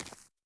奔跑 左

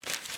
折纸

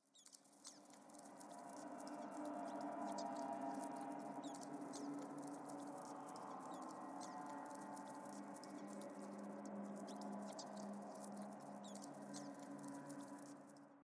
诡异通用, 环境音

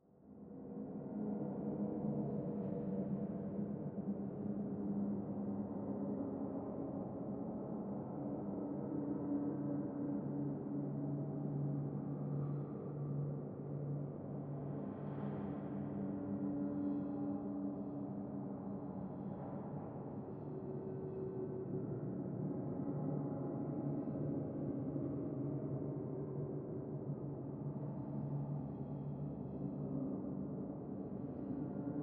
新场景背景音

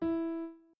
院长房间 钢琴

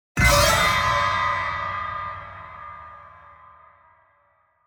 出现

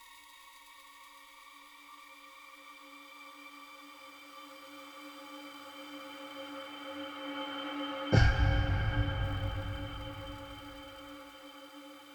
猫结尾演出; 盒子猫音效铺设

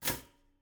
sfx 交互失败

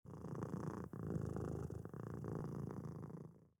猫咪呼噜

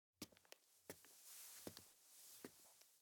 胖子拖

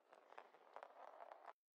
弹珠滚过来

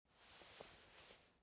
爬行

